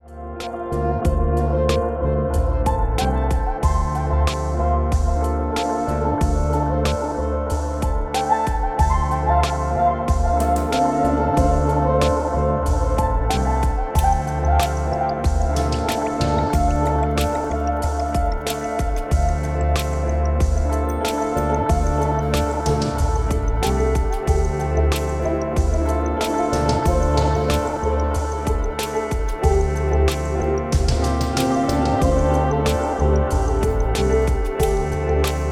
Ambient Track

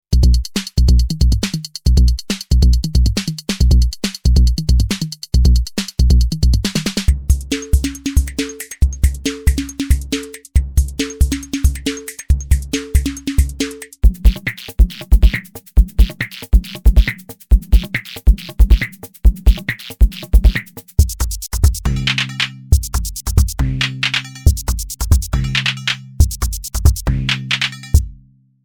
ND2 Uptempo Beats

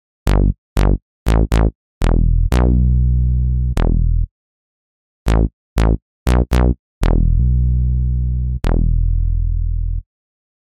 Retrigger Mono

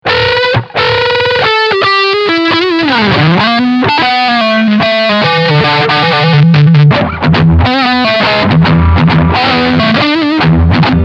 Lead Front SC

